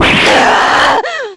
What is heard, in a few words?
anger; uzuki